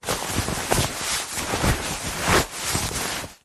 foley clothing jeans denim pants trousers handling movements rustle